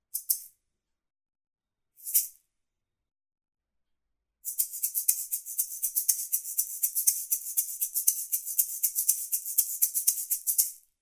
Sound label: shaker